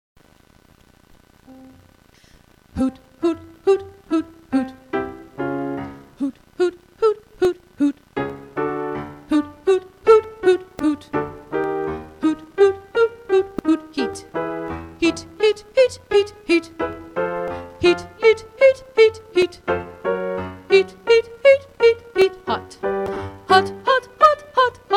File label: Warmup, Vocalization